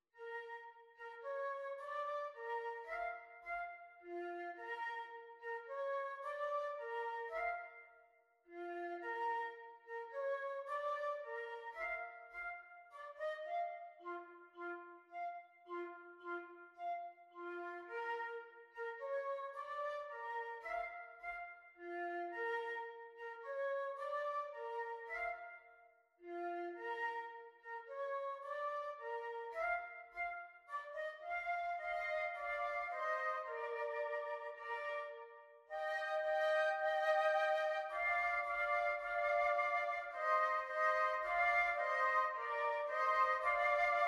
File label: Flutes DUET